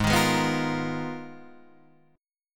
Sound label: Ab Minor 6th Add 9th, Ab, Guitar, Standard; strummed; JGuitar